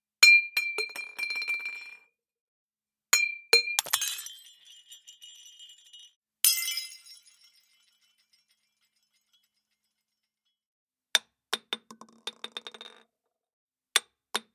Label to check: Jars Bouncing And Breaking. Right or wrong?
Right